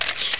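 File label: 35mm Camera; GEOGRAFIA; FOTO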